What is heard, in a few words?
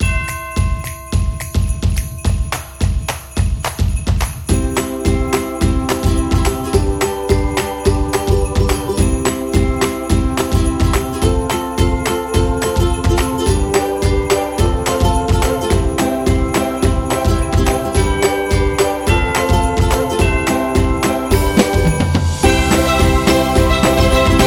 Winter Folk, xmas